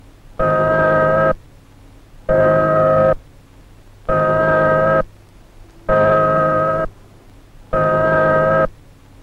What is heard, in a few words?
Fallout Approaching Whistle